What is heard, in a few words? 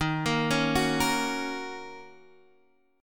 Eb Minor 7th